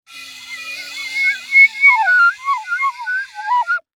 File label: Cartoon Flute Broken; AdobeCC